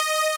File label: lead